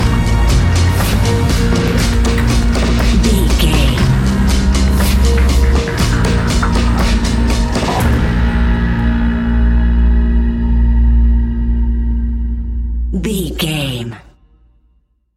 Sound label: suspenseful nightfall